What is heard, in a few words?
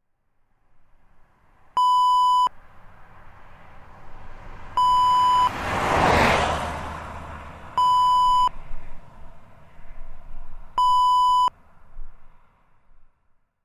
car passing